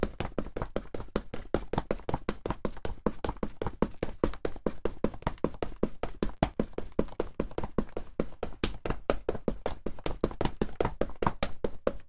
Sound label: dash asphalt